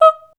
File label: D#5, Voice